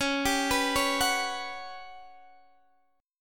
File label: G Major 7th Flat 5th